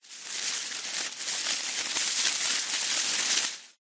magic plant vines leaves movement wrapping around reversed; zapsplat